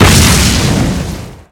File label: combustion